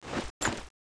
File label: transformgun draw; weapons